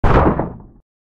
Cruiser Hit Obstacle; Lethal Company

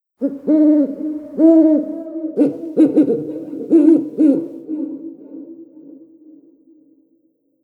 Devils tower Owl

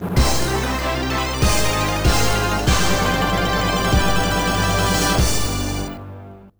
Balloon March 1 (Stage 4 Boss Entry BGM); TwinBee Yahho! Fushigi no Kuni de Ooabare!!; Konami GX

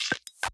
Task Notification Normal

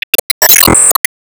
Enemy Birth Set

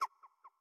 Bat Hurt

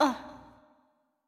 Hurt; Jackie

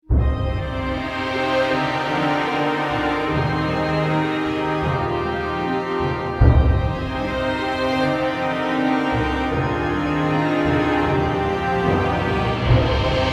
Black Organ; Pankapu

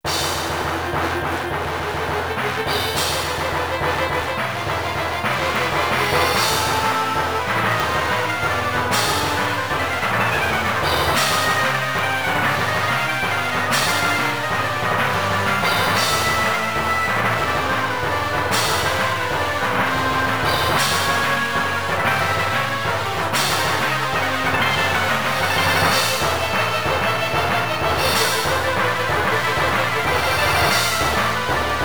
Boss Battle 7 Lich

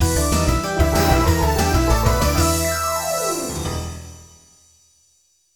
Stage Clear; bonanza bros turbografx 16 1992; (TurboGrafx-16) (gamerip) (1992)

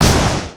Lightningspear Fall Hit; gamerip

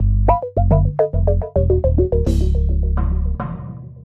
Jingle Fail; super rub a dub; ps3

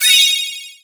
Ingame Bonus

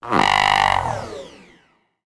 Lose sound

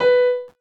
VOC PIANO; gamerip; PS3

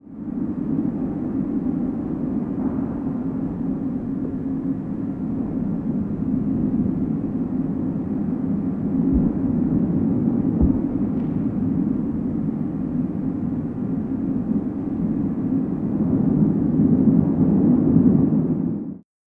Laquinta Wind

